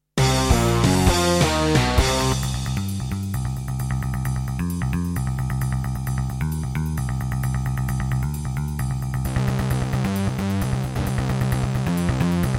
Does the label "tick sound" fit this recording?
No